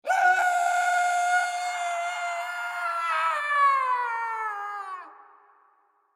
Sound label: Scream Horror Blood Curdling Falling Reverb, Scream Blood Curdling Falling Agony With Reverb; Screaming Sound Effects; Voice; BBFX